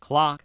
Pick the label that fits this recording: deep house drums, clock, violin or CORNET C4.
clock